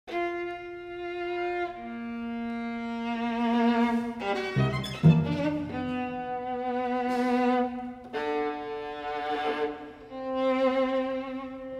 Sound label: string trio